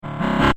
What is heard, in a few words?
Sci-fi Opening; science fiction sound effect; star wars / trek style